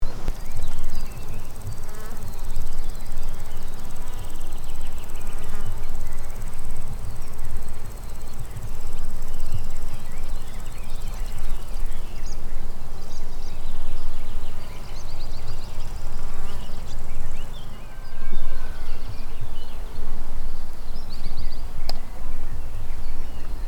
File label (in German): grasshupfer wiesengerausche